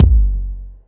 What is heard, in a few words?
Ball Collision Geo Extra Hard